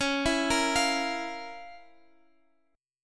F Major 7th Suspended 4th Sharp 5th Db, guitar chord